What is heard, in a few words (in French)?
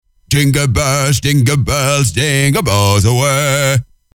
Jingle bells (chant père noël ), ACAPELLA; pack noel